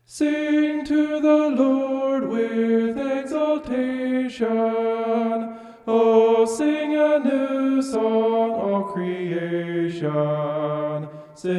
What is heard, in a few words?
vocal homoph; psalm 96